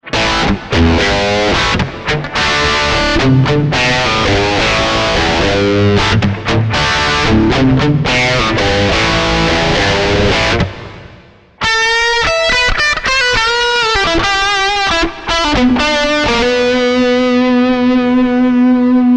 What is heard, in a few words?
bridge dirty; axetec